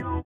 sitar, Indiatek; Spectrasonics; Metamorphosis

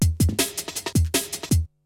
BREAKBEATS, TECHNO

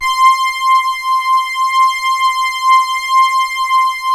C5, ANALO STRING; Sound Gallery - Charged

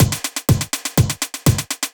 Beat, Drums & Percussion; Electrocution; Beats

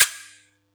Yamaha EX5, Drums

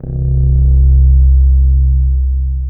BASS L, Drum & Bass 160 BPM; Ueberschall; Drum & Bass Flash Trackz

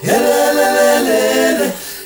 HELELELE, Heart of Africa, CHOIRS; Spectrasonics; Heart of Africa 2

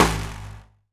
Bendir, Percussion; Roland BK-5

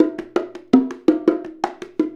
Bongo; Essential Percussion

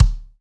sfx perc

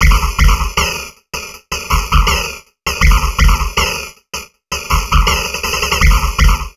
Drum Loops Crazy Processed

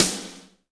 MIX SD; DRUM RYTHM; AKAI S6000 CD-ROM - Volume 1

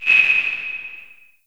LH CL808, REVRB LARGE HALL

